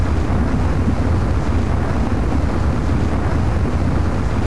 thrust, armor; Tribes2

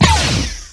blaster fire, weapons

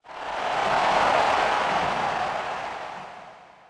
crowd fade

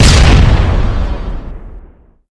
launcher

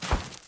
snowfall, environment; t2-mapper

